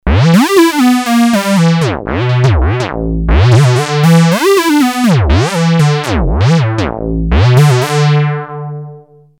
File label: synth 70, Moog Minitaur; synth seventies glided; analog monophonic synthesizer; 2 VCO oscillator